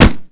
long punch

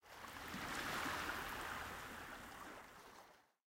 water splash

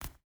footstep barefoot concrete jump land; Rust

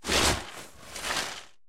unit entrench

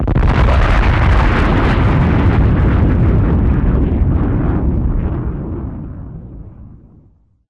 Sonic Boom; Aircrafts; DCS

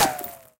bullet dirt

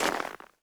Personnel Footsteps Snow; DCS

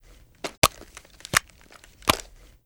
tacinsert flare lift; Call of Duty Modern Warfare

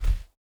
bear footstep sand hard; Rust

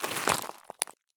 stop slate; Escape from Tarkov

